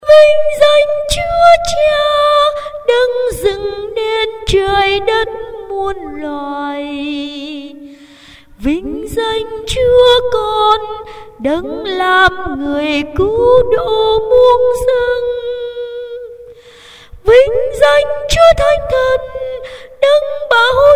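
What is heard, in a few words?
soprano tenor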